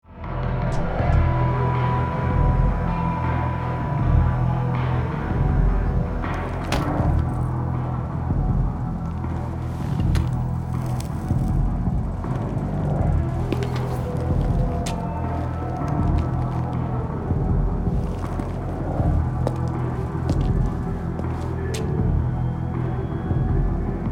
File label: eavesdropping sfx music